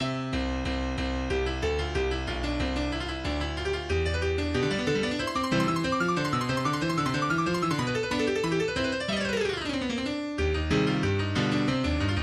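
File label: Rondo No1 in C major, MIDI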